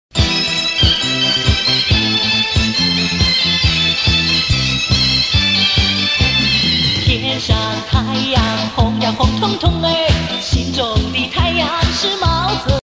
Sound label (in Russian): китайская музыка прикол